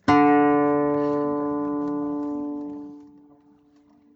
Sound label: note guitar